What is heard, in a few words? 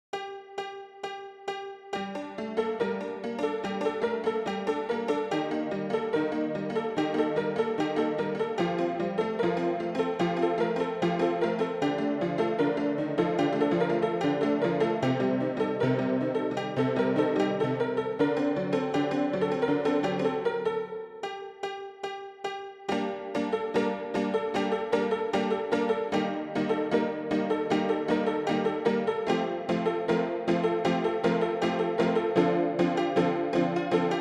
broken piano